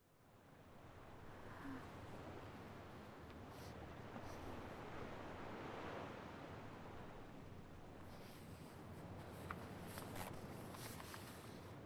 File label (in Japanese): 居眠りする書き物作業＆波の音 セリフなし